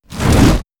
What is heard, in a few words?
Wall Of Fire; Battle; Wizard